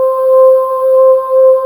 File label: VOX VOICE, KORG O1/W; Rubber Chicken Software Sample Bank